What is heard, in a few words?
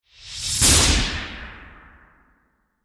Magic Spell Whoosh, Horror, Magic, Spell, Whoosh, To, Hit; BlastWave